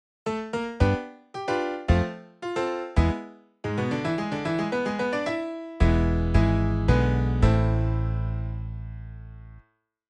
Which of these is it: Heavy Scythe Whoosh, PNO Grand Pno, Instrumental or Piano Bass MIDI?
Piano Bass MIDI